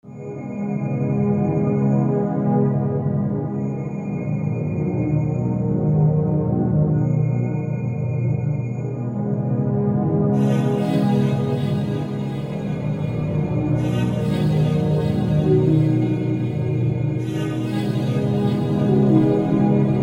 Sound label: melody